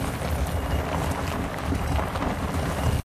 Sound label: Frosty Roll Loop; Hero Eiskalt